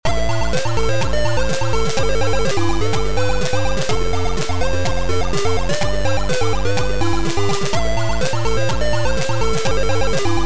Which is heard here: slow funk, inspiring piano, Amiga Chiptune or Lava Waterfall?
Amiga Chiptune